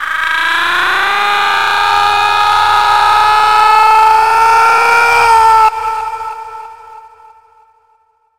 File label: death; handsome rogue